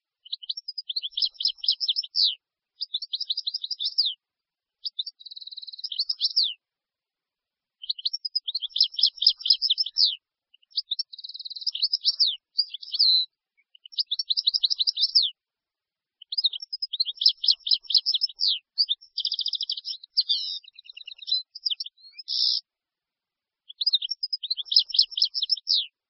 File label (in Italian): cardellino